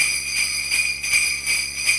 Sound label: Sleighbells